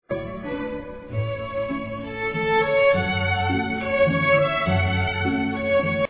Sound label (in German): walzer